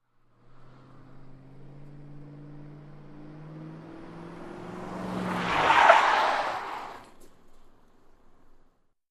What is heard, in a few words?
Car Emergency Break